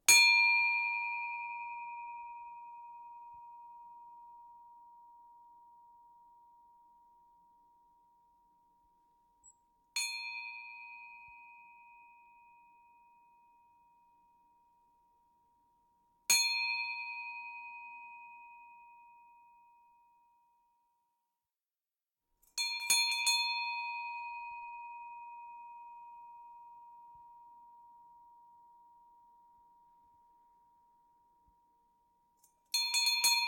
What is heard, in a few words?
Bell